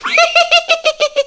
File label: Laugh; Yuga laughing (also used when wall-merging in 2nd battle); Zelda